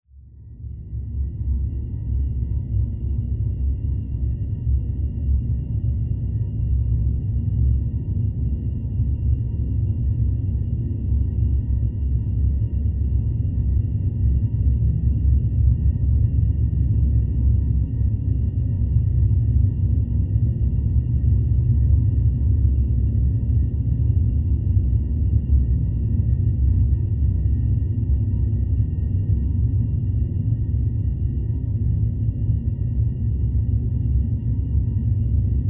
science fiction spacecraft interior drone rumble engine room or cabin; zapsplat